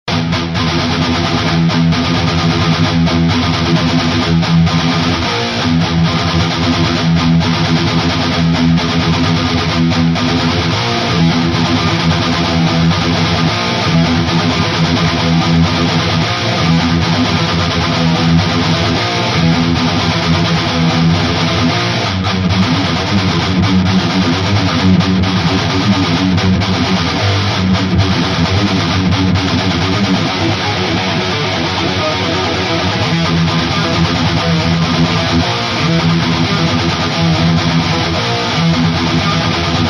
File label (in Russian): гитары; Второй план. Причем во время соло они уходят на третий план, уступая место соло